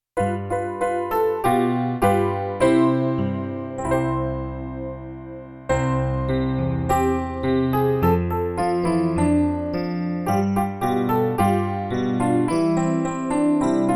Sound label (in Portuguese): playback hino